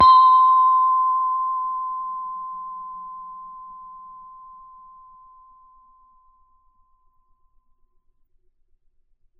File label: individual gong